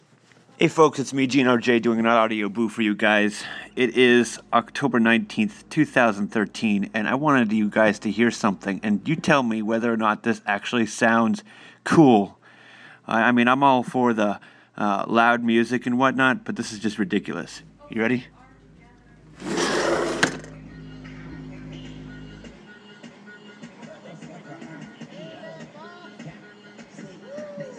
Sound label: really loud music